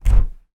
household door cupboard under stairs open air rush